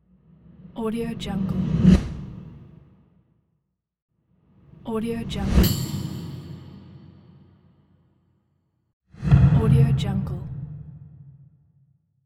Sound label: transition wooshes